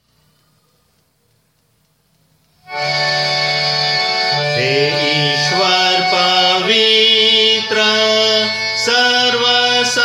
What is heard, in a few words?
Hymn Tune Sung; Nicea; Holy-Holy-Holy; Guj Notation